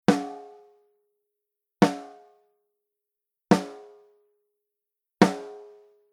Focusrite828 med z, snare drum; preamps

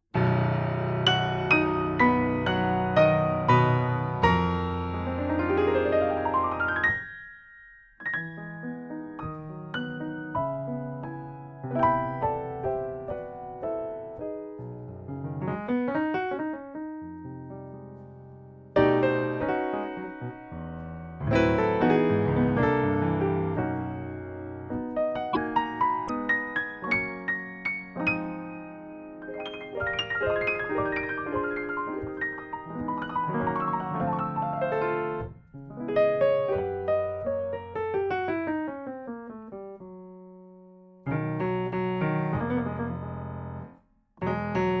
Shoeps, record a piano; how to record a piano

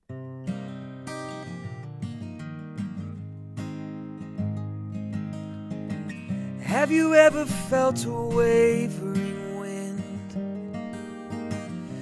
Music, Shure MV5 Flat; usb mic

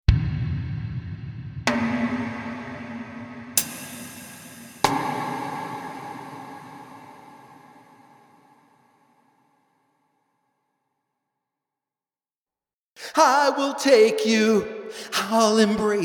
Idealized Convolution Reverb; impulse responses; Anderton

